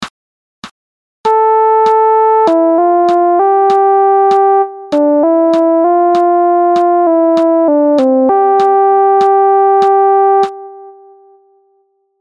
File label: Sad Melody